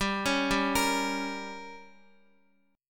G Diminished, Guitar; arpeggiated; x,x,7,6,7,6; 4F,4A,5C,5G,5C,6E; JGuitar

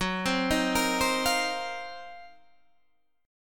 Eb Minor 6th Add 9th Gb, guitar chord

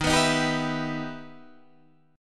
E Minor Major 7th Eb

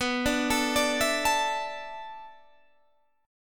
B Minor 11th B

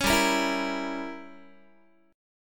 G Diminished 7th, Guitar; Gdim7 strummed

